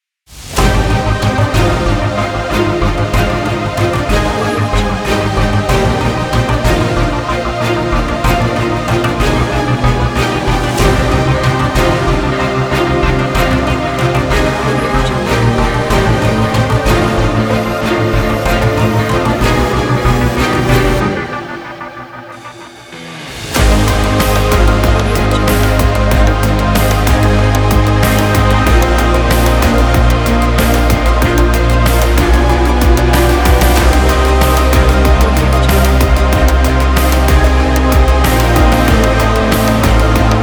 strained electronic epic

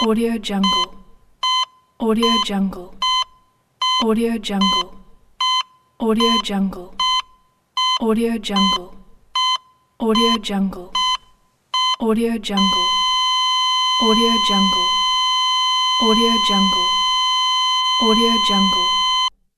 heart monitor

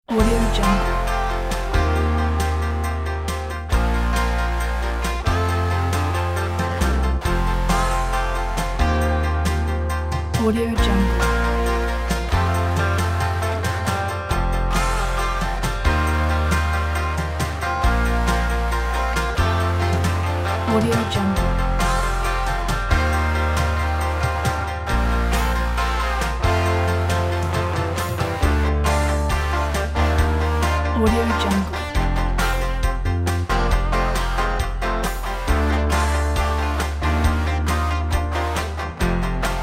country road; ncmusic

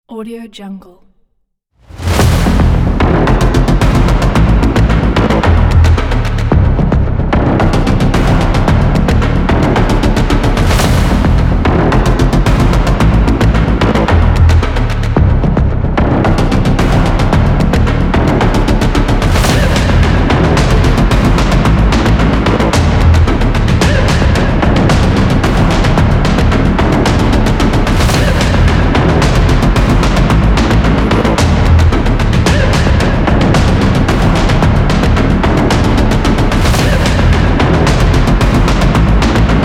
industrial trailer percussion

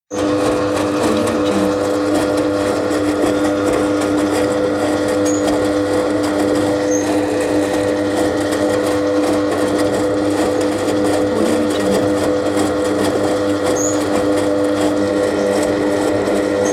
old washing machine

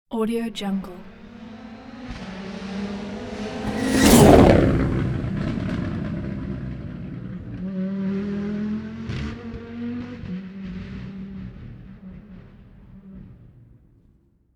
racing car fast driveby; fxprosound

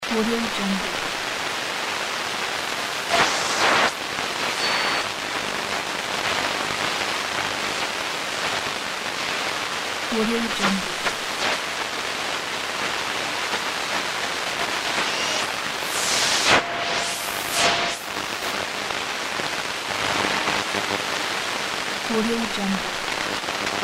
old AM radio seek and scan; dellostudio

